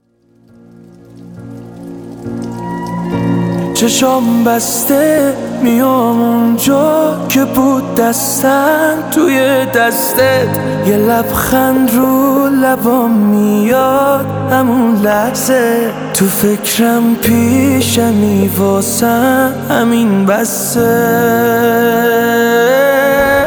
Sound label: Piano